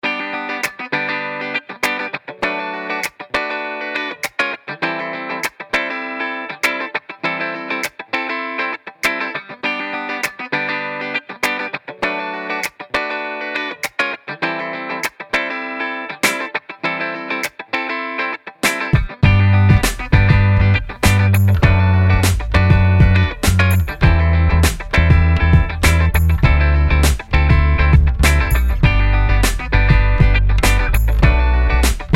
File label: chill moments funk